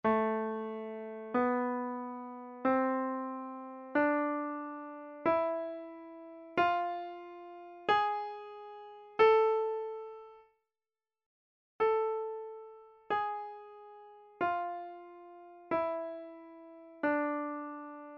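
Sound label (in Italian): Esercizio sulla scala di La minore armonica, pianoforte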